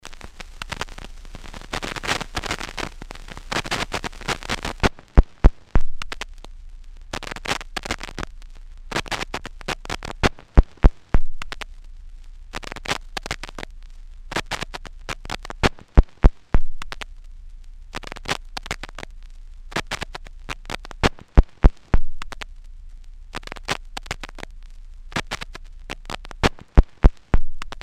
crackles, Vinyl; Misc Percussion

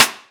collapse, Snares Knocks

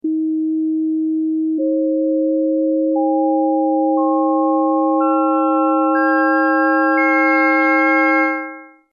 Harmonic Synthesis

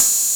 Hats, Electro Closed

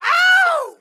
ow

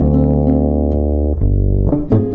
bass, Loops

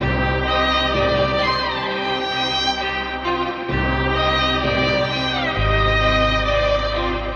strings, Sad Loops

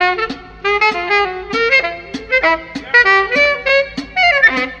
sax party groove, loops, phonky

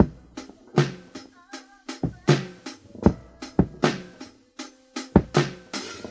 badly filtered, boombap drum loops

